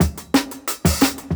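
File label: Jungle Breaks, 175 bpm; 90 s Sample CDs; Original Jungle Breaks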